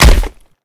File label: impact; smite; artemis v3 skin15